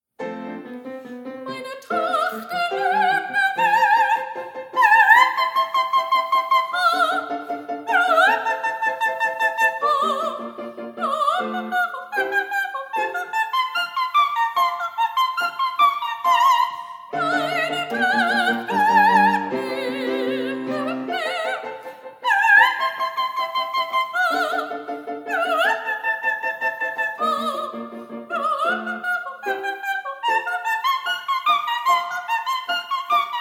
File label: Queen Of The Night GERMAN High Fs